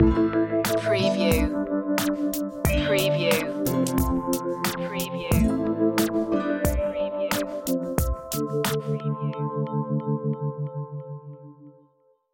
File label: hip hop stinger